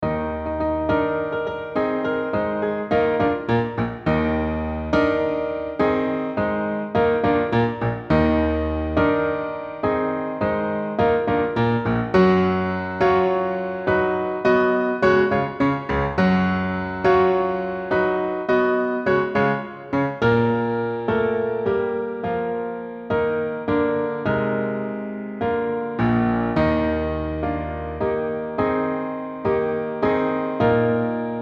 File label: GLORIA ACC